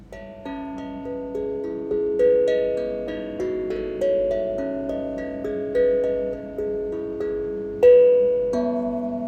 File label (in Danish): Lille tromme